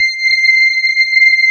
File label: electric pianos; Wizoo Electric Pianos